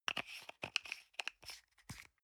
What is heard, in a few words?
toothpaste screw cup close medium